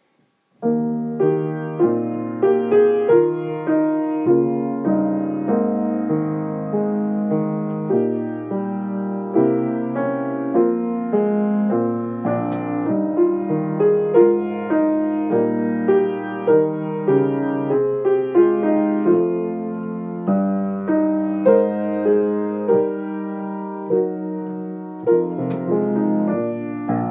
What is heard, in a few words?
vocalise accompaniment only; Grade one